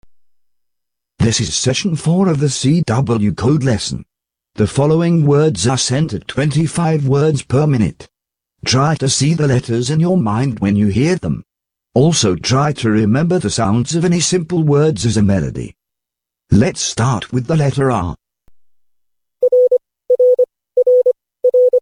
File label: cw training